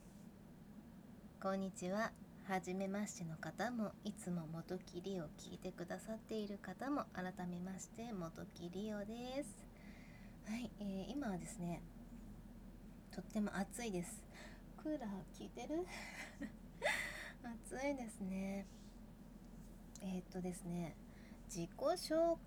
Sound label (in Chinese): 自慰; 实演; 清纯大姐姐做了一次纯粹追求快乐的自慰…！; 看似清纯的姐姐一边连续喊着淫语一边做着只管追求快乐的自慰…！